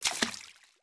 FS Water, footsteps

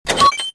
switch; Star Wars Jedi Knight II Jedi Outcast; XBOX; switches

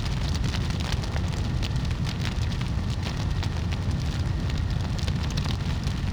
lava pit; Halfpipe

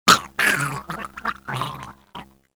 throat, pain death; fmale; Soldier Of Fortune II Double Helix; XBOX

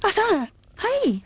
F happy

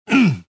land; Star Wars Jedi Knight II Jedi Outcast; XBOX; lando

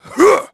effort; HADEAN; Pulse Racer

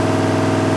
rpm, Engine; Cars; XBOX; Trophy

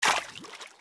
FS Water Deep Wade, footsteps

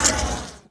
laser Bolt ric; impacts explos

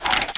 Rifle Equip, Sniper Rifle

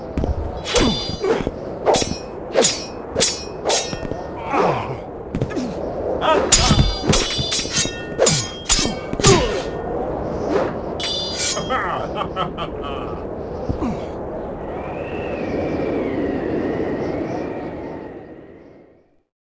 duel outro amb win; Sid Meiers Pirates; XBOX

